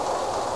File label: RAIN; CD Action